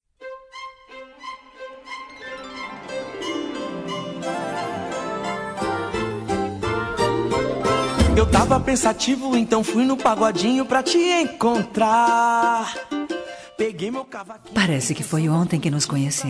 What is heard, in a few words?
ROMANTICO F; Romantica